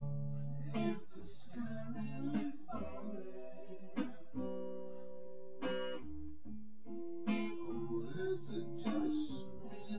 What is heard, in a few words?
test nodrums synthconv 250ms 1m src; SiSEC08; SiSEC underdetermined